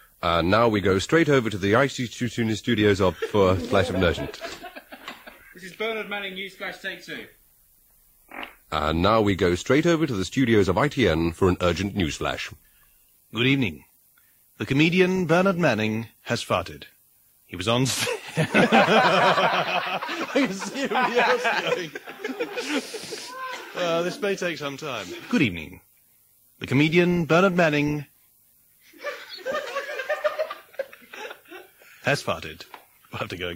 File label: farted session